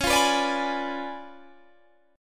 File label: Db 7th Flat 9th, Guitar, Standard; Db7b9 strummed; JGuitar